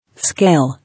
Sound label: Scale